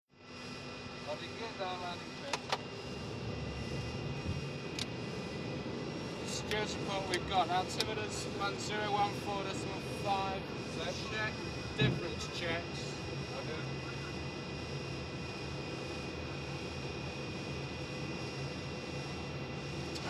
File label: 707 Cockpit Flaps, interior perspective